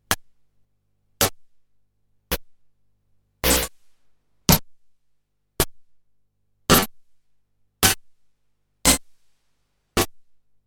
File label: Korg DDD 5 Sharp Mod